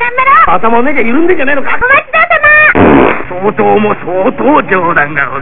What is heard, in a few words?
fart; Farting Japanese; You may not be able to understand what they're saying